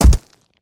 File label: Heavy Rock Impact